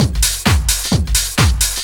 groove, DRUMLOOPS; Techno Trance; Ueberschall; Techno Trance Essentials

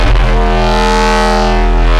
fm filth, bass

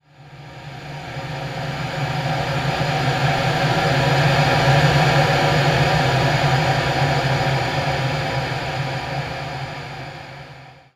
Gaseous, Noisy; Zero-G Seismic Frequencies

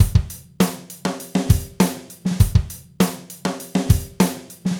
Gretsch groove 90 BPM, 1967 Gretsch Kit drums

